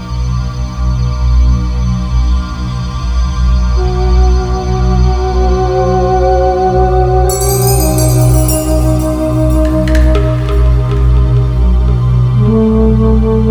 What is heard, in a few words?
Book Room Music Loop; moul-assets